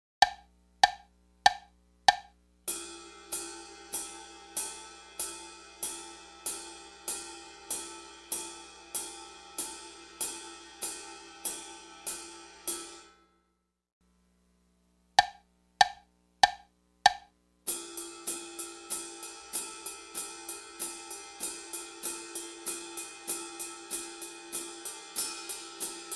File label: my left foot, drums